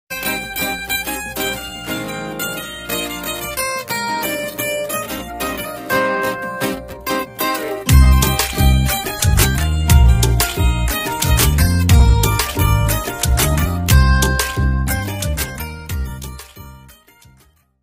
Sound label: High Volume Ringtone; ringtones